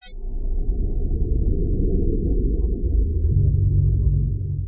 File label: sound bell; xmas